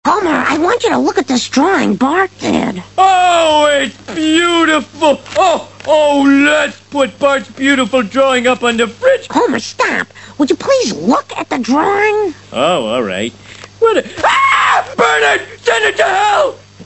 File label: sax